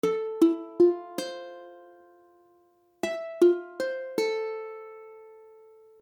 Fmaj7, akordy ukulele

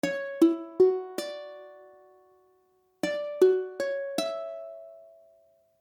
Dmaj9, akordy ukulele

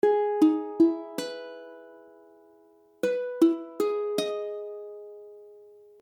Emaj7, akordy ukulele

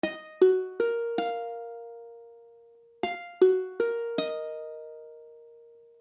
Ebmadd9, ukulele; ukulele akordy

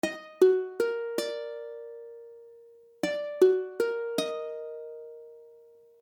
Ebmaj7, ukulele; ukulele akordy

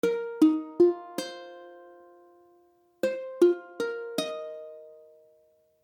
F7sus4, akordy ukulele

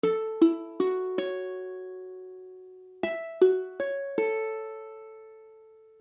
Fism, akordy ukulele

